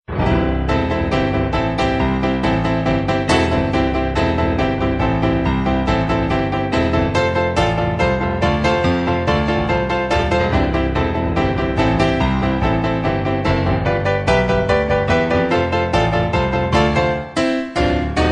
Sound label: cool piano blues